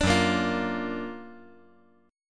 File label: F sharp Augmented Major 7th F sharp; strummed